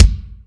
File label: normal hitnormal